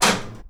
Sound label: metal interaction